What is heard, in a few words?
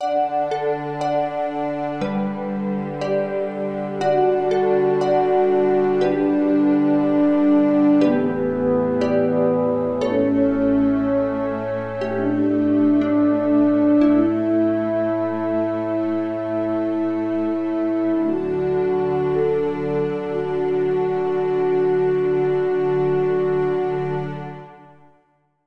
Outro